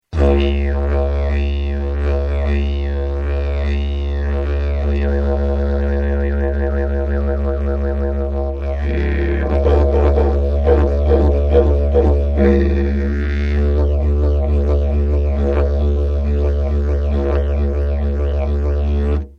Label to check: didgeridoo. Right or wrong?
Right